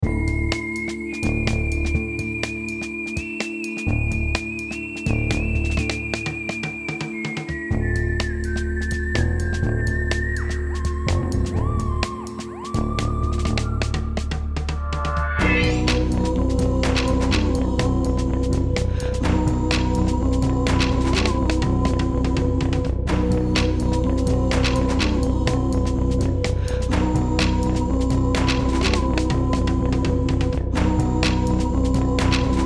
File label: Trailer Music; starstruck hands of time